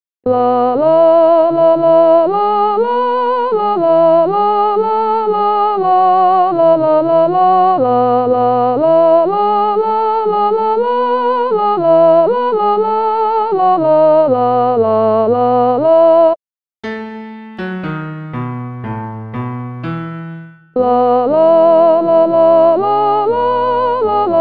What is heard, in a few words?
Soprano; Avondale